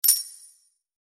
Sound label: ping